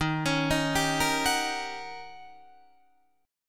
D sharp 7th Sharp 9th, Guitar, Open D

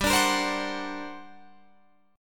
G Minor Major 11th, Guitar, Open F; strummed; 19,21,22,19,21,19; JGuitar

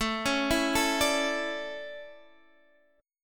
D Major 7th Suspended 2nd

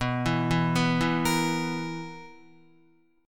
B Major 7th Suspended 4th, Guitar; BM7sus4; arpeggiated; 6,7,4,4,4,6

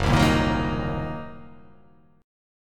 Fsharp 6th Flat 5th down, chord

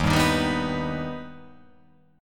D sharp Augmented D sharp, chord

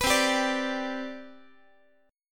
C Major 7th Suspended 2nd C down Guitar, chord; CM7sus2 strummed; x,x,14,10,11,13; JGuitar

